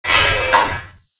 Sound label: heli door